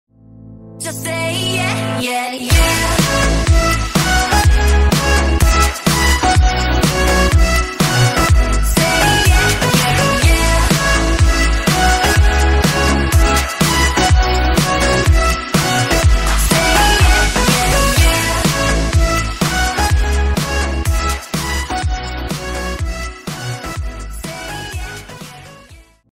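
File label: background music korean ringtone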